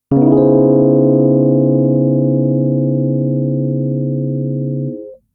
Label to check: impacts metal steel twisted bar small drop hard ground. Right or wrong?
Wrong